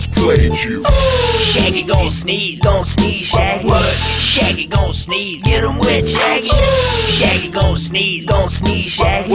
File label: sneeze